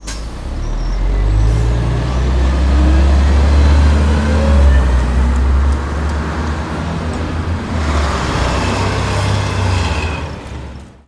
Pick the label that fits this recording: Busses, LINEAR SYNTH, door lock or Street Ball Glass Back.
Busses